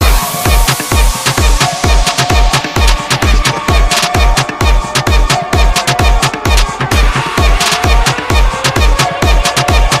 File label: DROP THE BASS